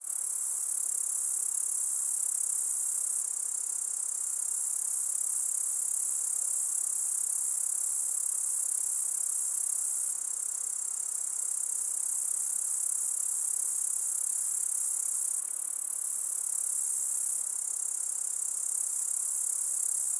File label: Grasshopper